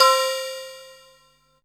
Synth Bells; Dream Zone

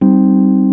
Rhodes Chord; Mo' Phatt; Digital Sound Factory Mo' Phatt

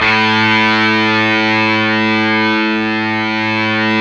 GTR, Dirty Buzz; Club-50 - Foundations Roland

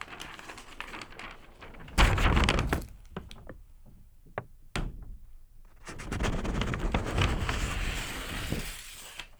PAPER; InVision Interactive; The Piano

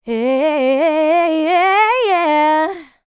LICKS, Vocal Planet, R&B; ILIO; Dance; LT R+B

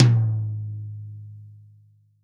TOM, Real Drum Kit, POP KIT

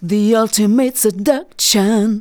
vocals sung; Techno Trance Essentials

